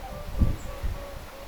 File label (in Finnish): onko oranssisirkku